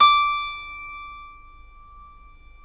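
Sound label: D 5 clavinet, Keyboards Acoustic; USB Soundscan vol.17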